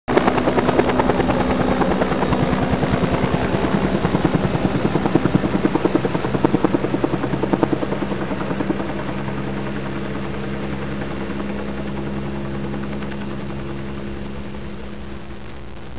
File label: tank